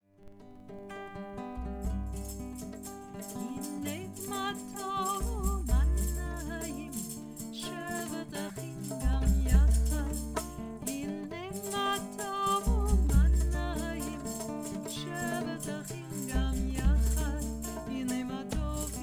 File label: Hine Ma Tov folk tune